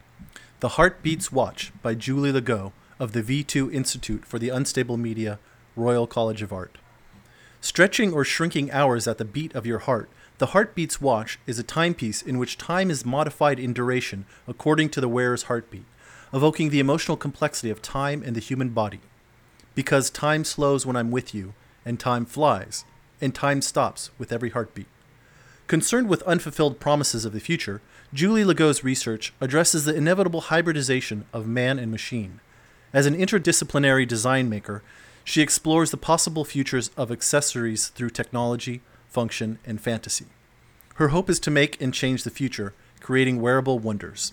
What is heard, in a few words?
heartbeats; english